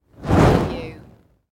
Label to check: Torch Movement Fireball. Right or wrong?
Right